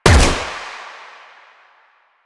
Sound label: Fire, Weapons; Latron Prime